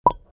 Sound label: water drop